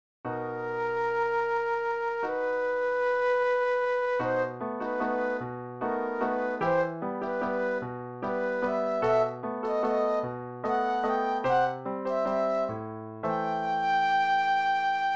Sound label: Flute Solo